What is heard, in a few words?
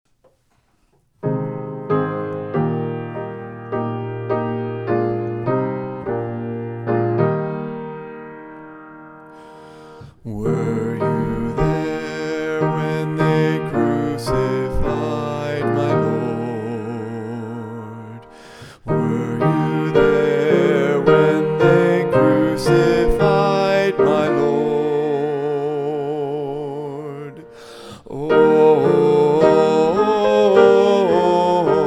Were You There SATB A